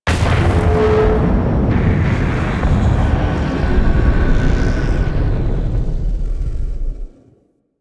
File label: Transition Ship Rumble A; Missions